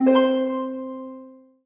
PUZZLE Success Harp Three Note Bright End Wet; stereo; Universal Sound FX

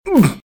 hurt; RadwinIsland

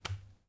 hands on a wall; Apotheorasis

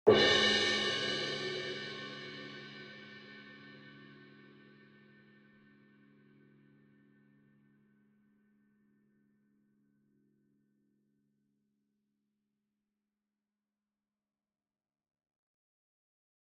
CYMBAL Crash Hit Soft Beater Near; boom library; Cinematic Strikes Construction Kit

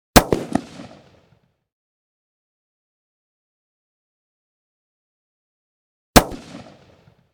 .308 Blast Medium; Hollywood Edge; Heavily Armed

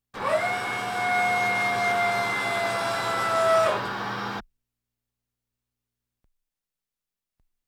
Military vehicles

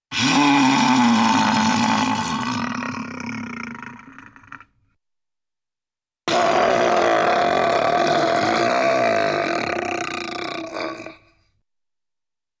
Roars Sneeze Howls, Cartoon; Hollywood Edge; Cartoon Trax

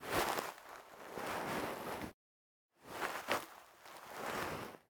nylon cap put on & take off; human; NS Studios - ultra sound collection

